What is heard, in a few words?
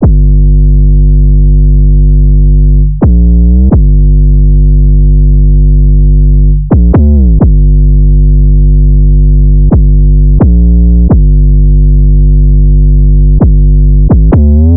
808 loop 130 Gm; Slate Digital BASSHOLE Sample Pack